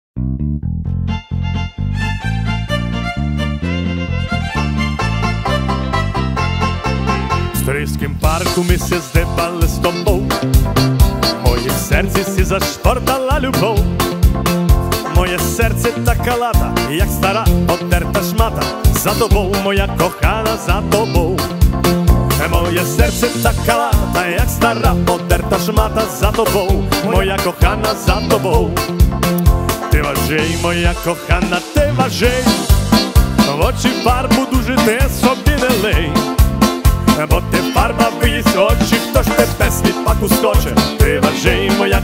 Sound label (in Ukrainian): cover наживо Ketron Audya5 Ketron Event Yamaha MODX